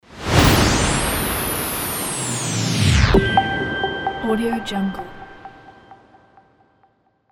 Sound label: elegant short logo